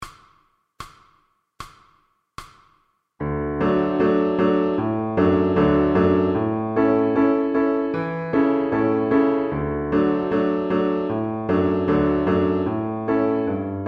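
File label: Piano Accompaniments for Violin; MTB Pre- Grade Introductory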